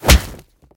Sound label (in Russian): krepkij udar kulakom po licu